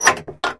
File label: open window